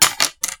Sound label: Tech Deck Drop